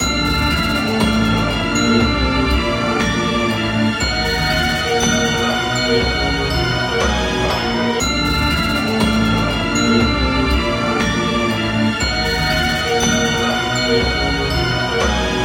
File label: bgm